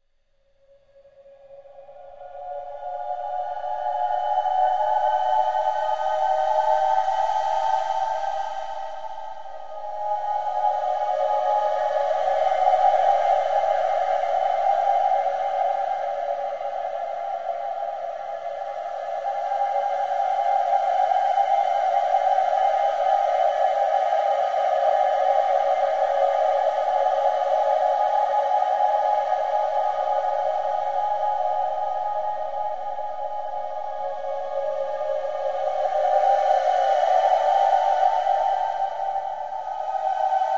Sci Fi Atmos Vocal Like, ambience

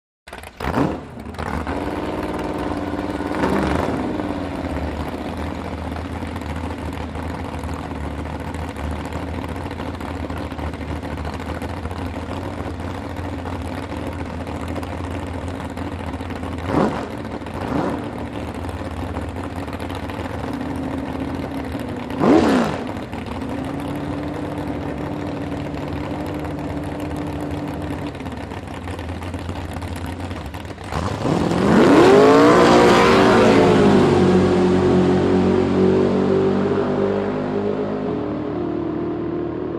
Drag Boat Start revs

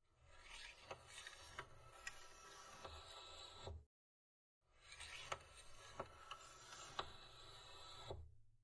Antenna; Telescoping Antenna Up And Down, Slow.

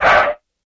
Car Sngl Shrt Skid

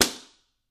Book Slam Slap Good

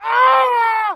Death Screams 2; 10 Male Screams, Various

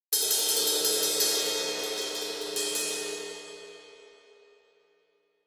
Cymbal Trill

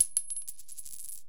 Coin Fall on Concrete

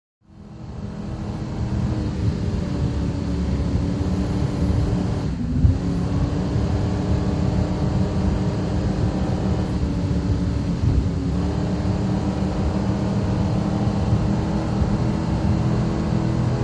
Power Boat Int Racin, off shore power boat

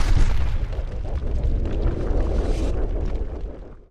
Impact Tremors, earthquakes

